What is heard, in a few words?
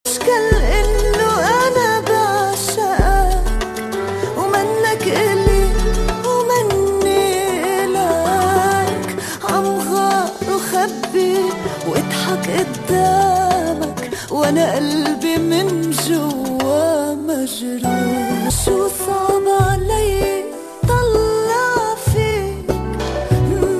Super Sad Arab